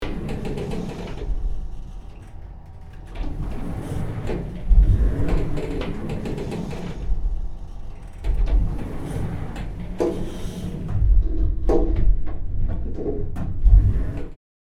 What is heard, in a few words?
Service elevator moving moderate clanging